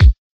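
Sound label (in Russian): несколько одиночных выстрелов для бас барабана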